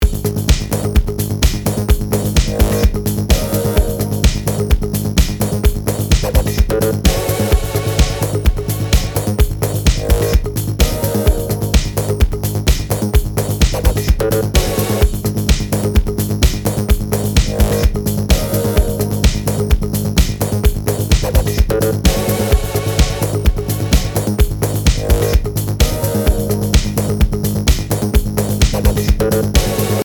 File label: loop me